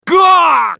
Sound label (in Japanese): ダウン; エデュアルド